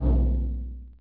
Negative Dink; Civ4 warlords

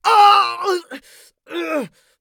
agony; Escape from Tarkov

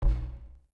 Screen Error; Civ4 warlords

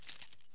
belt pickup; Escape from Tarkov

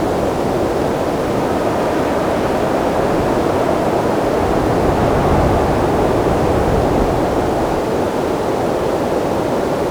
Amb Weather; Red Orchestra 41-45

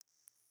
water drip light; Rust

